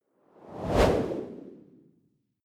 whoosh simple hard long

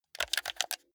console controller play sequence short fast

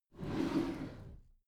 packed drawer smooth slide close

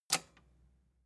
metal button robust spring push enable

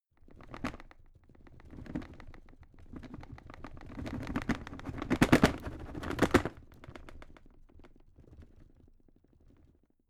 drawer cabinet carton rattle

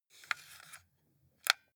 console controller xbox joystick move

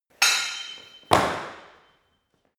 sword hit sword tackle

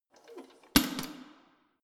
letterbox metal push close hard

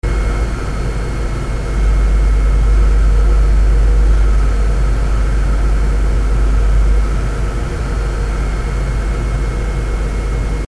cooling fan noise warm noisy

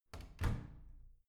washing machine close soft

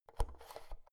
oven knob press in

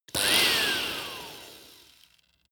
vacuum cleaner hand-held on off

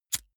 lighter wheel spin

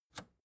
record player power push off

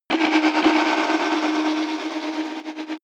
drill synthetic

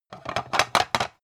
pan rattle

